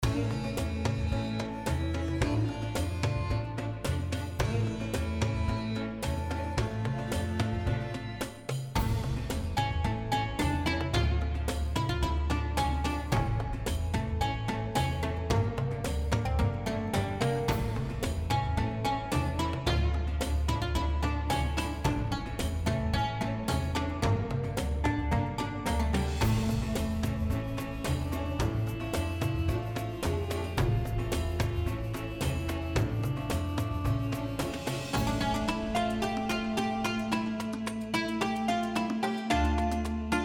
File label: Scene Town, music; NewWrold